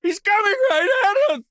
town human far; Blind Drive

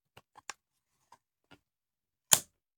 Machine Gun Foley; Sound Ideas; Guns

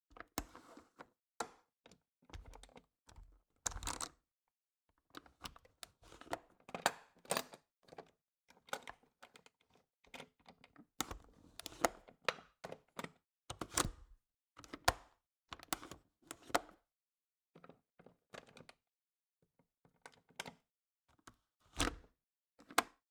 Cabin, window, latch, flip, lock, fiddling, hyper; Eiravaein Works; Vaeyan IV

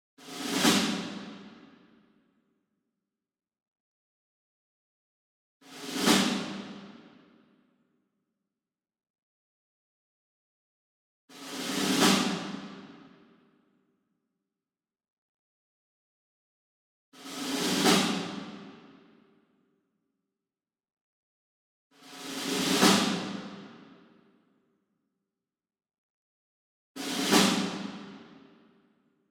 SINGLE SNARE Pearl High Tension Roll Far; boom library; Cinematic Strikes Construction Kit

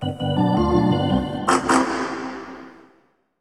Hockey Organ

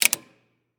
Semi Truck Garage; Large Vehicles; Blastwave

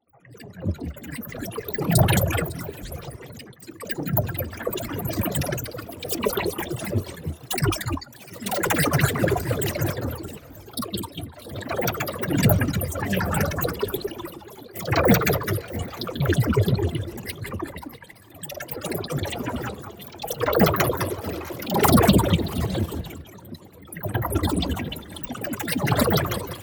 PARTICLES Mana Injector; boom library; Matter

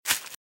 Velociraptor - Footstep - Grass - Run - Left

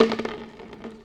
Roll Popcorn Bucket; SFX Kit; Foley - Misc

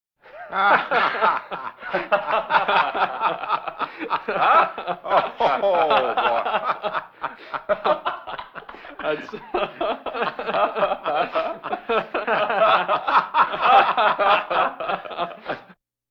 Vocal effects breathing Moaning Baby; Valentino Production Sound Effects Library CD50

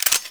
Gun Ammo Pickup, Weapons Foley; SFX Kit

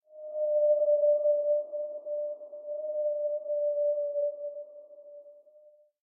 Jungle Bird Calls - Night Double Call Reverb; Hollywood Edge; sound designer's toolkit v2

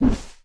WHOOSH Miss; mono; Universal Sound FX; WHOOSHES

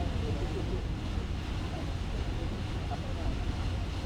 ship sailing ambience; vehicles; NS Studios - ultra sound collection

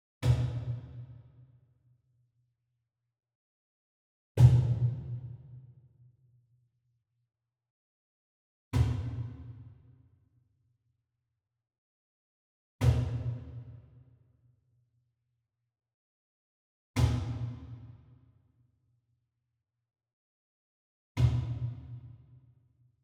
Wood Percussion Cajon Bass Slap Hit Far; boom library; Cinematic Strikes Construction Kit

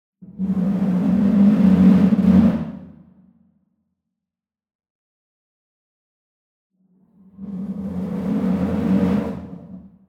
Sweetener Lion Roar Medium; boom library; Cinematic Strikes Construction Kit

